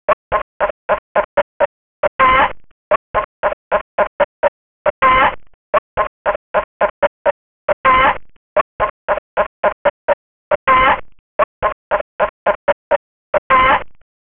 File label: Hen